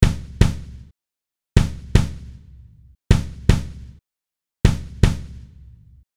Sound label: kick Flat